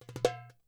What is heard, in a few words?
DUM ROL, Percussion; Sampleheads; NYC Percussionworks; ST PERC VOL1